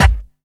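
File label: snare drum bass drum, Dance Mega Drums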